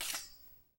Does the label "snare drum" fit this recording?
No